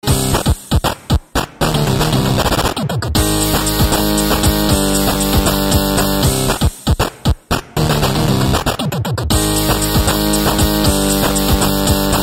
8 bit

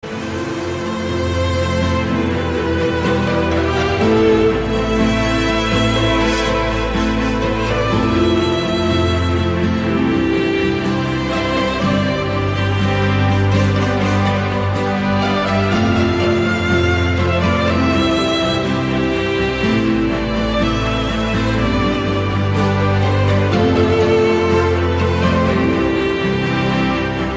Emotional Ringtone

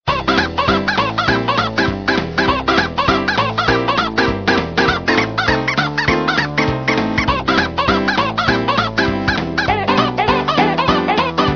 Mood

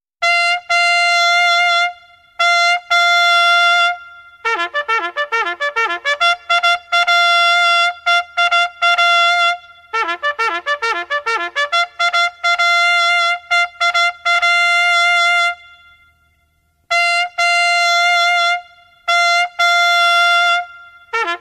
To Arms Bugle

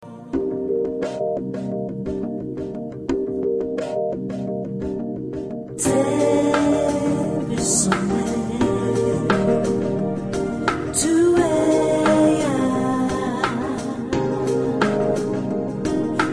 Romantic Lounge Tone

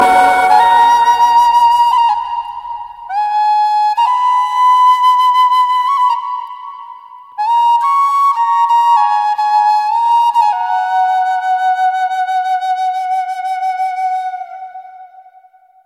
Angel Sweet Flute

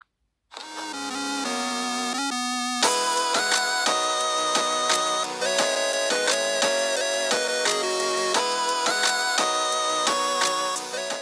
Polyphonic

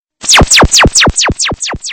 Lazer Sms

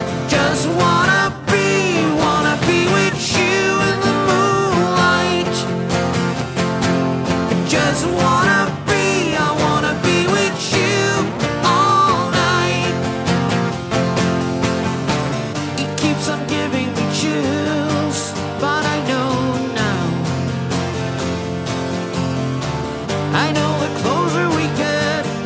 Acous

